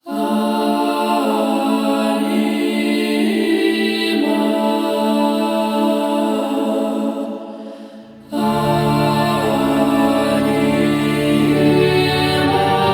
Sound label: Login Music; Login Screen; The Old Peace